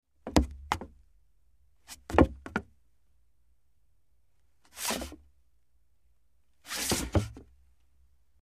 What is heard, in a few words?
Footsteps Hollow Woo